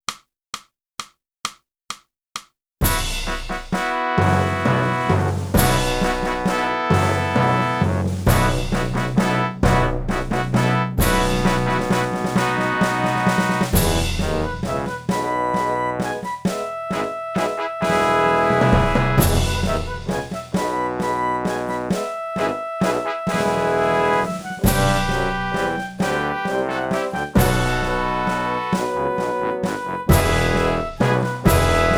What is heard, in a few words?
flute; boosey